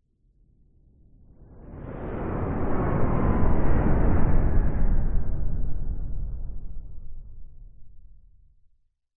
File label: science fiction spacecraft large fly overhead low